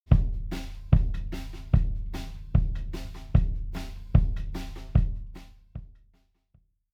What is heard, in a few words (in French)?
kick sans; infinistrip